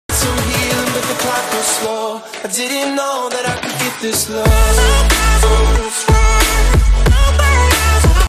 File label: trap